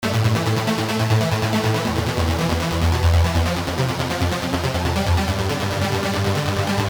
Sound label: power synth